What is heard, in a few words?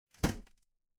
impact slam